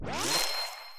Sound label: MOVE; C64 Dreams; Multi-Sound Default